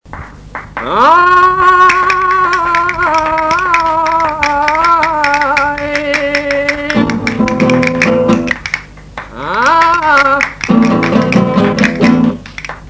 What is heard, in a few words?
Flamenco Explosivo